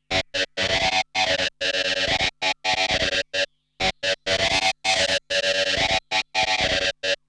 SIREN; 90 s Sample CDs; Zero G - Funk Guitar

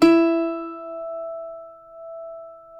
CUATRO; Spectrasonics; Hans Zimmer Guitars VOL-2

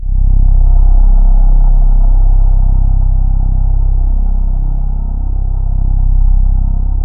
JV-1080 PEACEFUL; VINTAGE-EXP

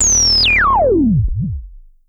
SH-09 Tone Drop

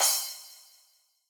Yamaha DJX 2B, Splash Cymbal

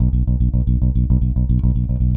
Sixteenth Notes B, Bass

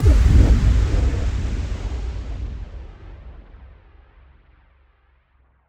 Impact; impact samples

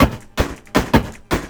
LOOP, 160 BPM, Drum & Bass

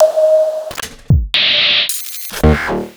Clavia Nord Modular Kit II

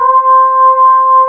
SYN VOX

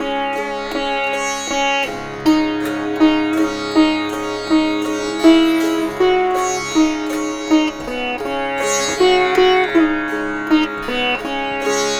Sitar, East Indian; Sonic Foundry (Sony Creative Software); Continental Drift Aftershocks

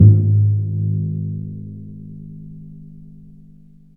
PRC TIMPAN, Timpani Dry; Roland LCDP03 Orchestral Perc

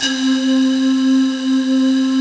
FLUTTER PDS; TR PADS; Pro-Rec - Synthsonic

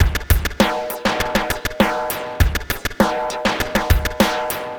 Noise Beat; Drums & Drones

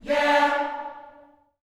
"YEAH" D, Gospel; ILIO; Vocal Planet

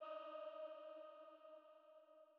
Female Pad D#3

